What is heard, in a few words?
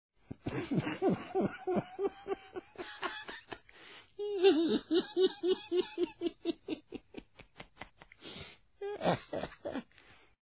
laugh; drakens varld